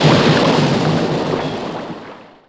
water splash big; WAR

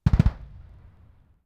distant gun 60mm; WAR

